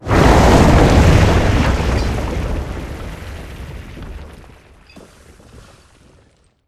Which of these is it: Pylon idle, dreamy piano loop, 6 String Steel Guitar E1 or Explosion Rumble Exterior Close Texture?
Explosion Rumble Exterior Close Texture